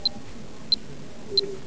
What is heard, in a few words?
beeps, casio